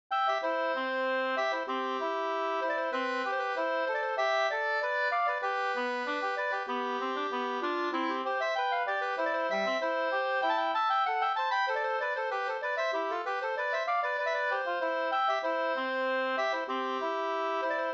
skanklat

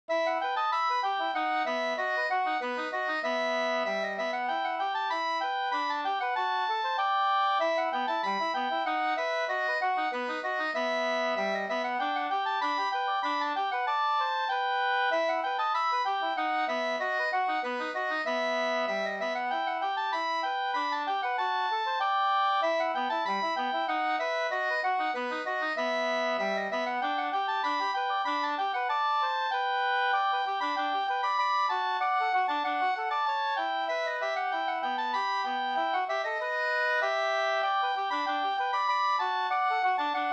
slängpolska

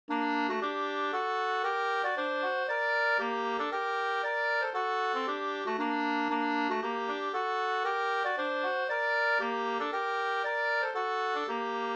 polska; Lonsboda Sk

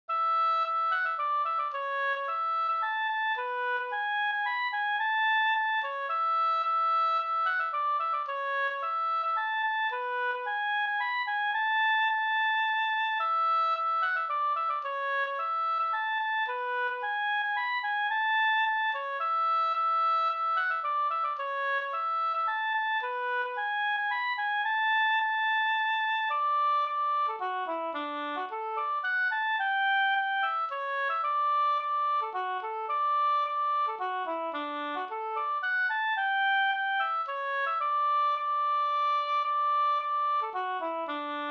polska Bodapolska

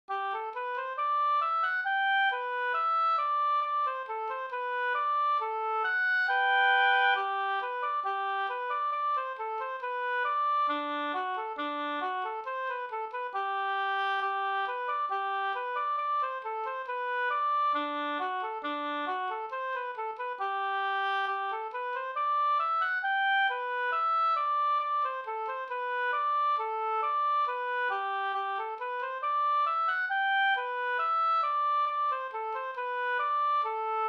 folkdans; Tantoli

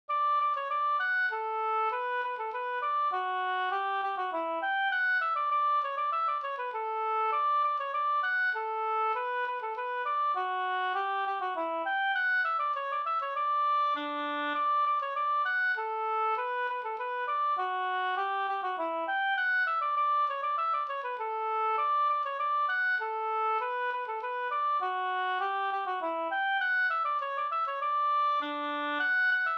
slangpolska

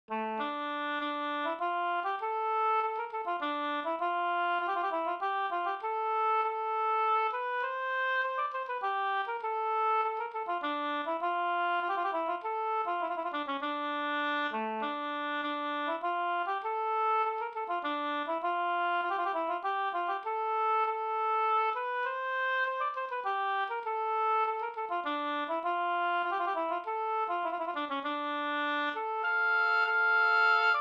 polska dmollpolska

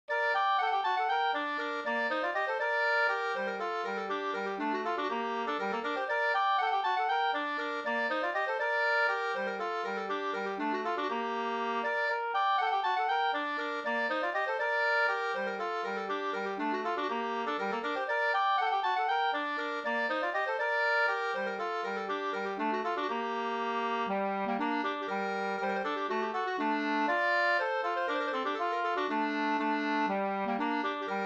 polska Bingsjopolska; Langtan av Pekkos Helmer 2st

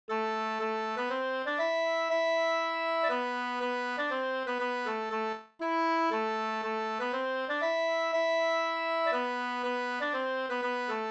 polska Bodapolska polska; Furuboms 2st

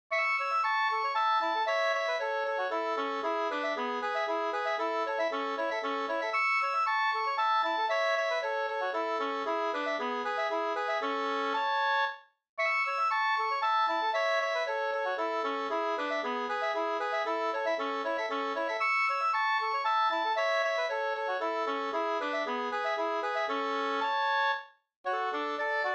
mazurka, 2st

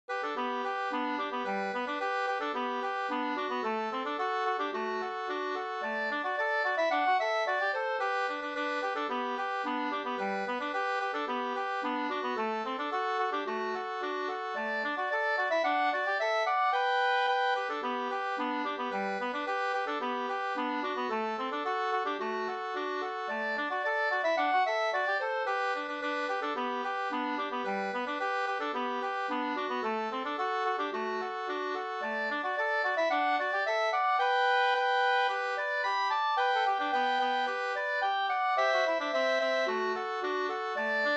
polka, 2st

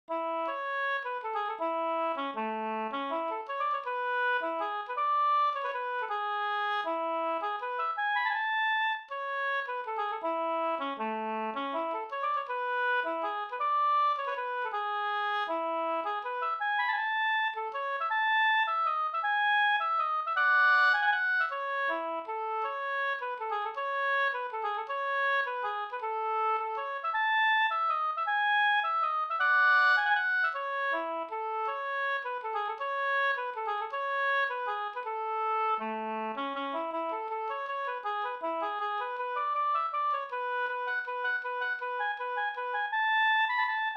vals; Bingsjovalsen e Hjort Anders

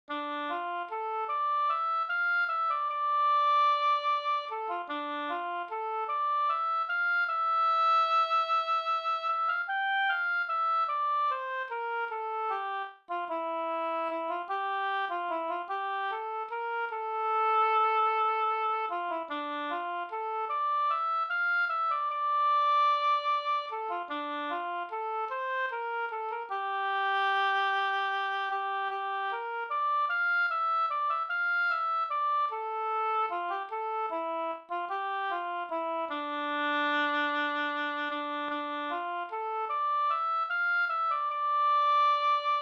vals

